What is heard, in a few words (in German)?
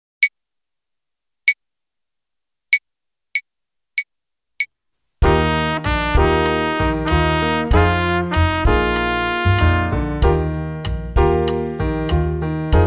Echo Ubung